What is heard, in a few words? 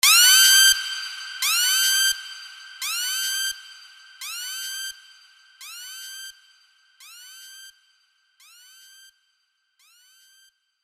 Siren